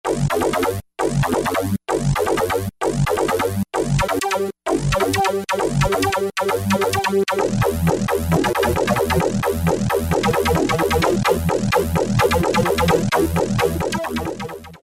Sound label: led-Nervosa; E-mu Orbit V2; Factory presets